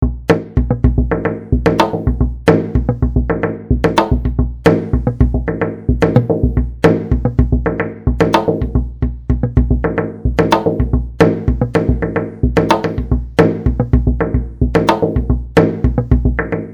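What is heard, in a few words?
rhythm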